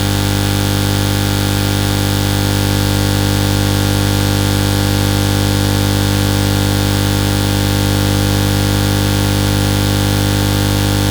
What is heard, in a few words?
Electric Buzz Loop, Stereo, Electromagnetic Fields; Justsoundeffects; VOLT